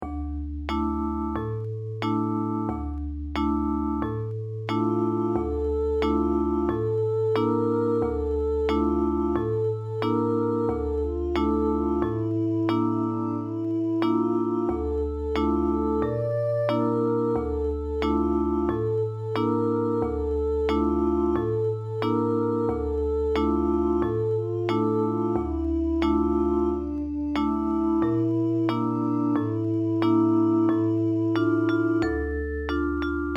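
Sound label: children's songs vocal & guitar; 4 children's songs; kiyomizu no kannon sama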